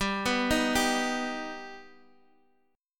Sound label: G Augmented G Guitar Half Step Down